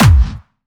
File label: Attack Noize, Jumpstyle Kick; Jumpstyle Multis; vengeance essential club sounds-3; VEC3 Bassdrums